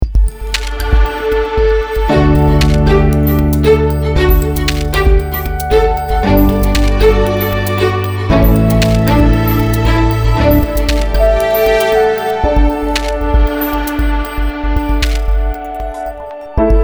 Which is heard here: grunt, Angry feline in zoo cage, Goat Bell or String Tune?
String Tune